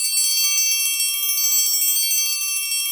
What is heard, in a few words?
TRI, ORCH PERC